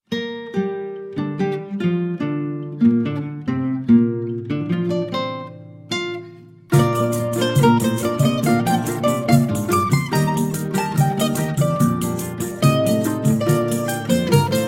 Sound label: choro meets ragtime